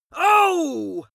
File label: pain compassion male